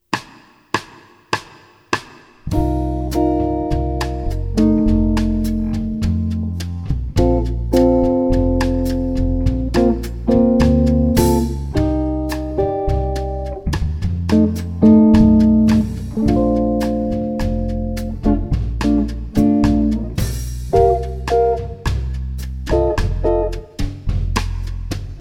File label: II V I Major; Jazz Harmonia